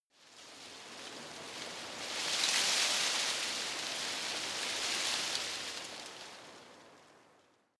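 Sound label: tree foliage rustle soft, garten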